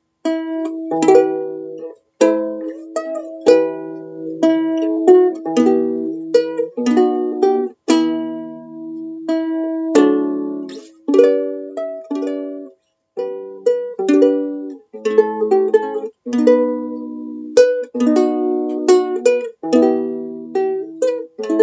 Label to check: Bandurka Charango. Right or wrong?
Right